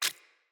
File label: Root Spider Step Water, Foley; Animals